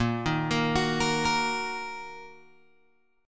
Bb Suspended 4th Sharp 5th, Bb, down, Guitar, Open G